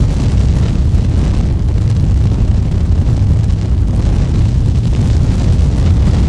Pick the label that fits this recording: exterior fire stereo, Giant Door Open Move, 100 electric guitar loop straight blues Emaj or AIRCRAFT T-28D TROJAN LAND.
exterior fire stereo